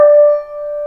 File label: combo power; tetrio